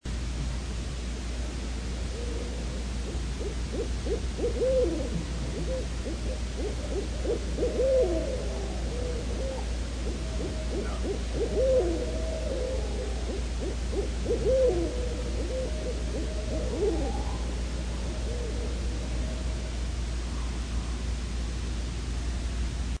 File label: barred Owl; Scott Lo